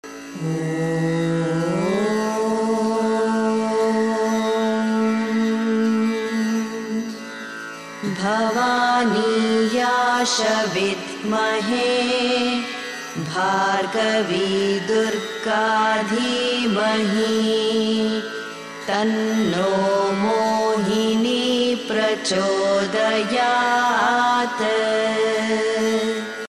shiva durga gayatri

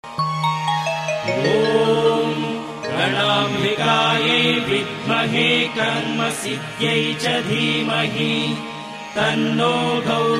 gauri gayatri male voice

